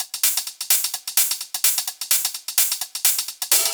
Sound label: Loops 128BPM, Drumloops